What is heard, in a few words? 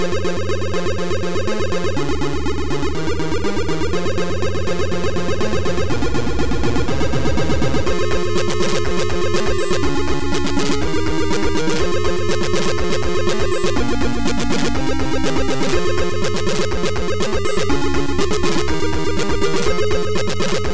Normal Beat